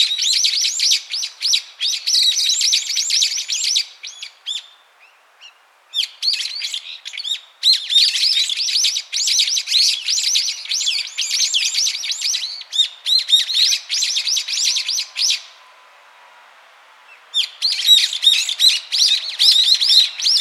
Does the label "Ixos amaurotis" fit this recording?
Yes